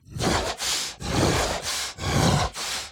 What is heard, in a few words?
Enraged Breathing Loop; The Town; Burns